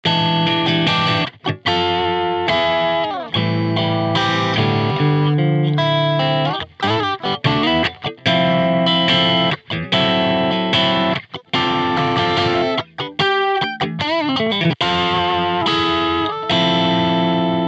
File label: guitar bias